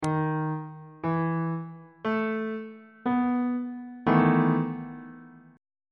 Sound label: d double sharp major 7th suspended 4th 3rd inversion on bass clef